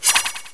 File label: Assassin Attack